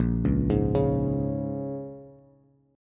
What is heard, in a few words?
Bb-Minor 7th-Bb-down-Bass; Bbm7 arpeggiated